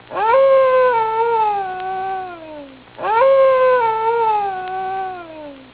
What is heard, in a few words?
coyote